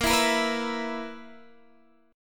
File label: B Major 7th Suspended 4th A sharp